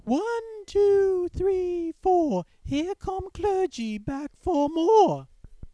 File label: falsetto